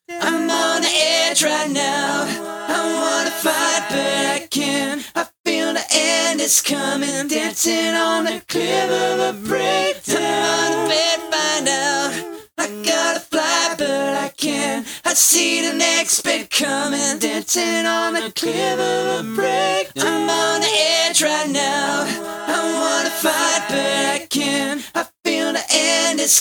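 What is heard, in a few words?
hip hop, 100, E minor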